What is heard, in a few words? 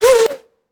Bullet Pass By; Sound Ideas; Guns